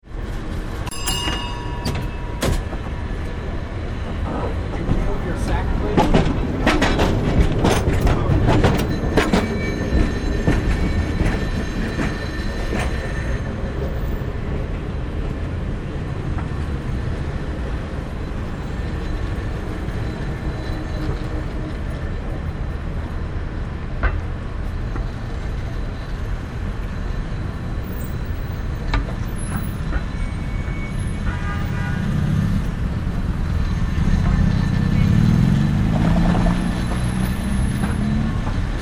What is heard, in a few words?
Cable Car